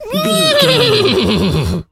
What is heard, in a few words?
horse neigh